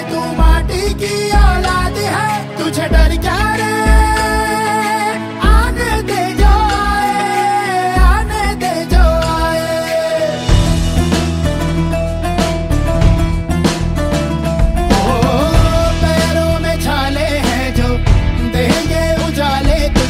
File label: hindi ringtone, bollywood